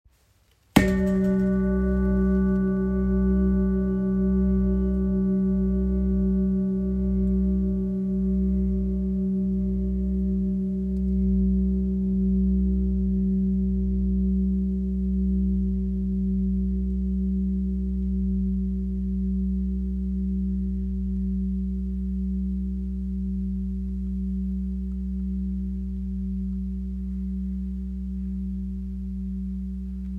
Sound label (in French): Gros bol si